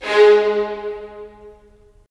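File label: strings violins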